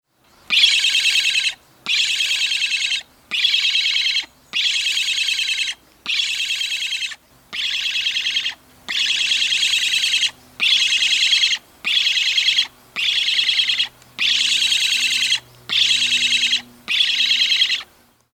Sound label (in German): Schwarzstorch Jungvogel